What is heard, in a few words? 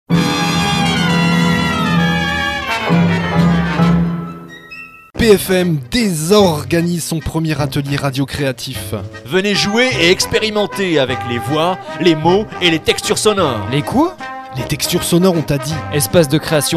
jingle